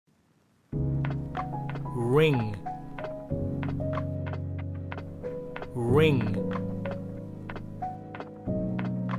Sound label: ring